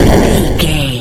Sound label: retro explosion deep